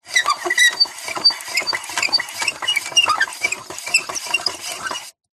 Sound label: industrial plastic handle lever turn squeak; zapsplat